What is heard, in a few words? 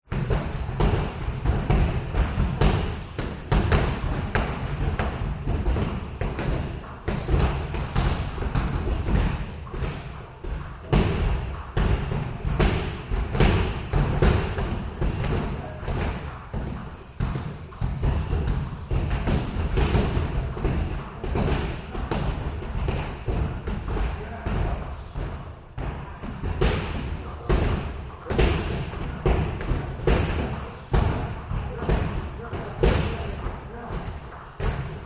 Boxing Club Boxing Kicking Jumping Pushing; USA California